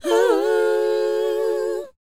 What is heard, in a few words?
WHOA E, Vocal R&B & Dance; ILIO; Vocal Planet